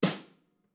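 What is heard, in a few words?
snare forte, marching; Membranophones; Struck Membranophones; Legacy Snares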